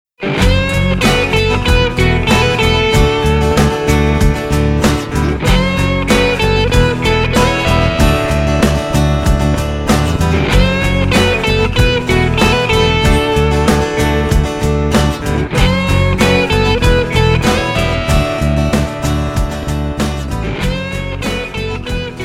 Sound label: ROCK Stroke CPX, guitars; musical-instruments; el-ac-guitars